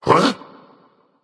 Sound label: confused; smite; voicepack; merlin